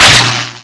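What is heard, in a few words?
electric spark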